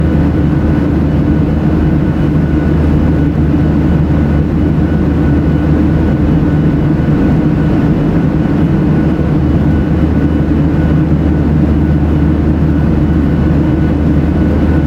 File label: airplane inflight; OpenValley